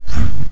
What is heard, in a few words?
Sound up